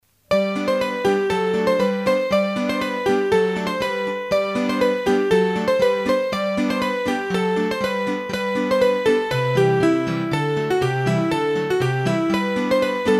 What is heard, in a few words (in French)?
Les Moutons, hurdy gurdy, Bourrees a 2 Temp